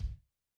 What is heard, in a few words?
Kick; Reference beat bpm95; urban grooves; Rock